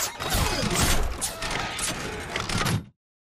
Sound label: pain knockback backward; Titanfall 2